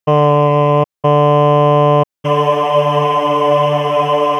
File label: resynthesis, choir